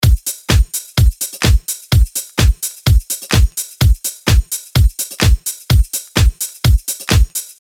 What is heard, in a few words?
Drums